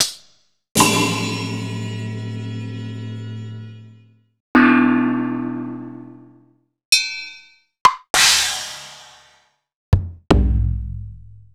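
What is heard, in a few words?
Akai MPC500 Chinese Percussion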